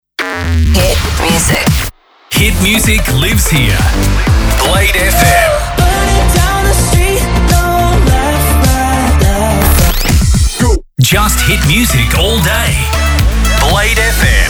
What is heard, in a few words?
Imaging Montage CHR; Blade FM